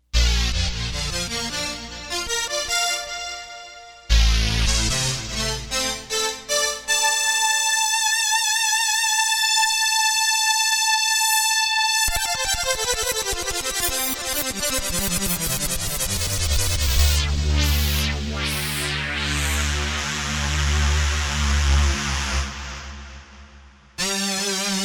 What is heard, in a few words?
flanged lead